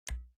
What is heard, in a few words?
menu navigate